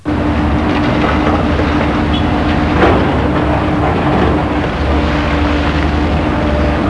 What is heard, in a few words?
noise